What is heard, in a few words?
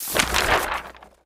scroll out; nahyuta